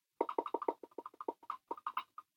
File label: pad trill